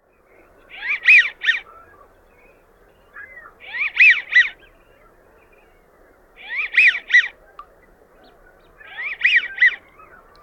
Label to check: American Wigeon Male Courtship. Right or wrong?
Right